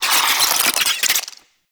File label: Infested Ashen Vocal Attack Death; Warframe